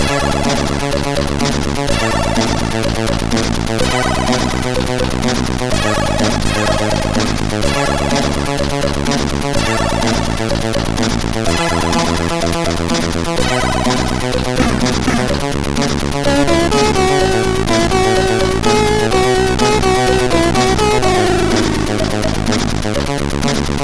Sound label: Intro, MOS6581R4; Chromance